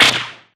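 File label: impact bullet generic titan shield; Titanfall 2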